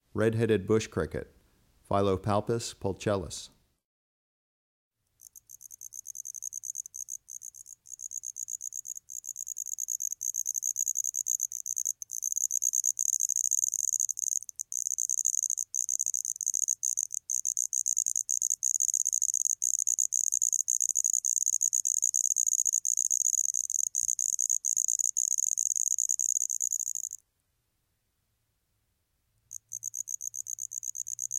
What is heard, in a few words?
Redheaded Bush Cricket, Phyllopalpus pulchellus; Crickets and Katydids